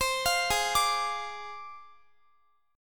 G sharp 6th Flat 5th G sharp Guitar; G#6b5; arpeggiated

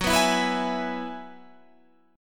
E Major F sharp; strummed

